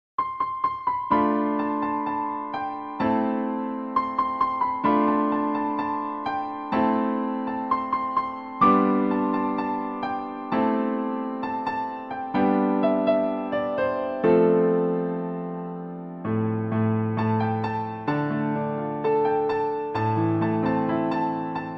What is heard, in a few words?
piano walk